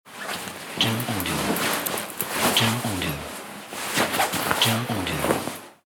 Jacket Cloth Dressing Up

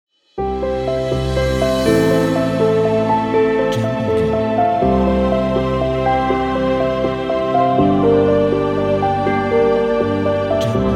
Cinematic Logo Intro

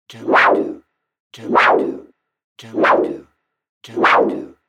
Whoosh Transition

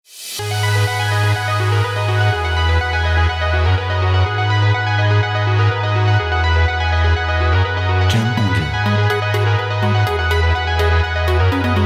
EDM Electronica Inspirational

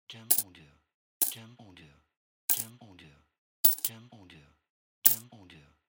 Coin Drop

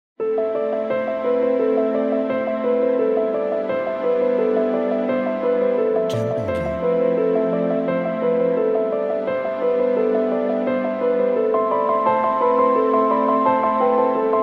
Dramatic Piano Strings

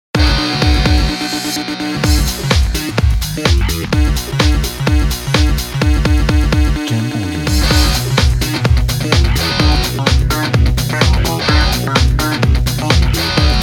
Rock Energetic

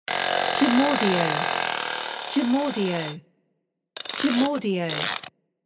Alarm Clock Spring Mechanism

